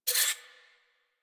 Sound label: Zoom In, Rifles; Snipetron